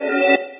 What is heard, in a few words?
achievement; VotV; VoidMod2